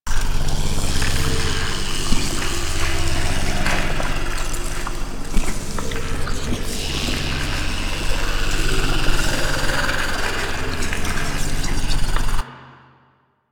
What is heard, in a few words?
Corruption Fracture Loop; Warframe; Missions; Zariman